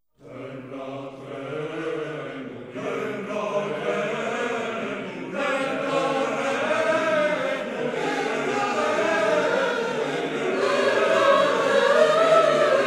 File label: Gregorian polyphony for chorus, Andante mosso; Momenti of Gregorian polyphony; Terra tremuit et quivit